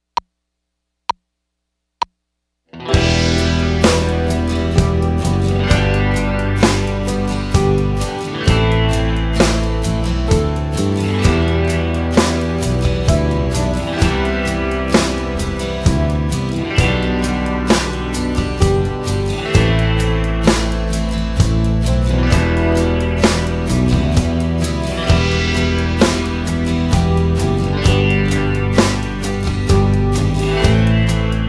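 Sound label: 3/4 Time Arpeggios Without Guitar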